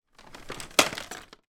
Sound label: household vacuum cleaner upright arm return snap up; sound effects one